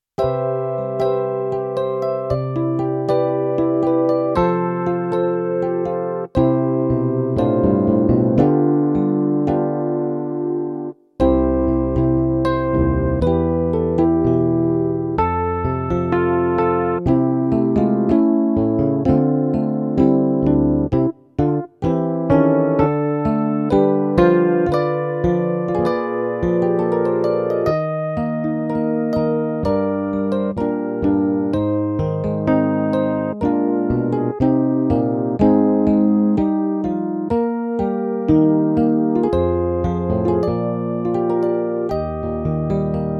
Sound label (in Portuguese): playback hino